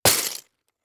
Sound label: Impact Plastic Debris Hit By Plastic Tube; Impacts